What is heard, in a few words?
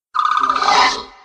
hoot roar